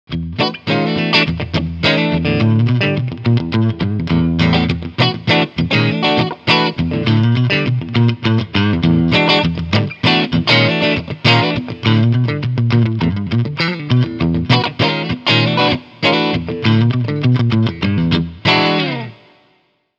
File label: Cleanchannel Funky